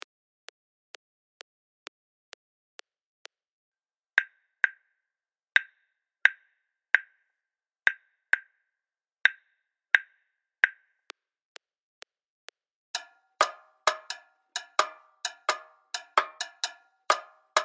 Cascara 130bpm; Lateinamerika

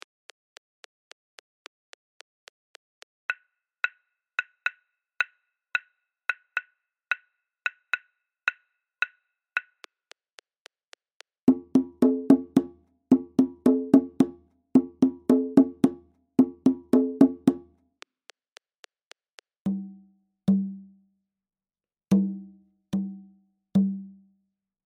Ibo 110bpm; Lateinamerika

